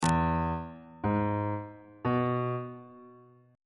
f sharp dorian chord 7 is e major on bass clef

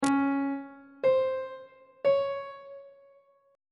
c sharp 8th interval pitches on treble clef

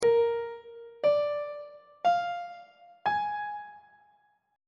b flat dominant 7th chord on treble clef

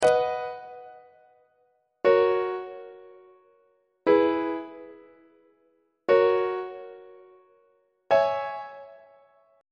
b major deceptive cadence; on treble clef

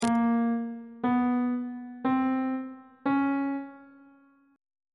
c sharp 7th intervals on bass clef

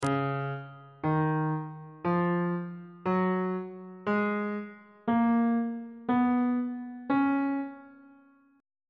c sharp mixolydian mode on bass clef

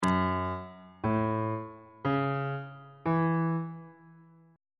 f flat major 7th chord 2 is g flat minor 7th

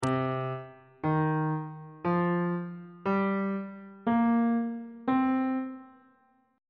c minor pentatonic scale on bass clef

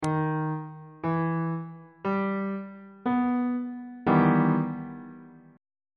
e minor major 7th 3rd inversion on bass clef

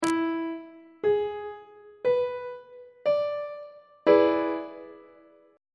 d double sharp dominant 7th chord on treble clef

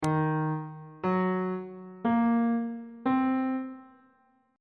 d sharp minor 6th chord intervals on bass clef

